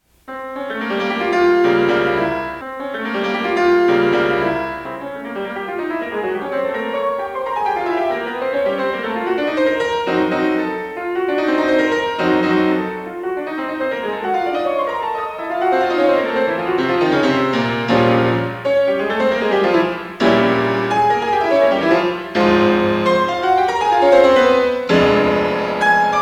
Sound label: Prelude in F Minor Allegro molto